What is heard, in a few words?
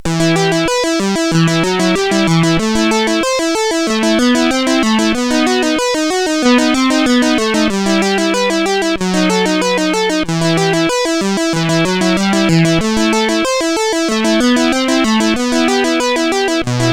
Instrumental Compositions, CSG8580R5